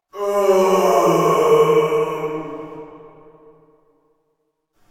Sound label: prison pain; Quake 4 Alpha; id software